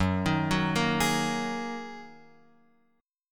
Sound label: Gb 6th Add 9th Gb down Guitar Open F; arpeggiated; Gb6add9; JGuitar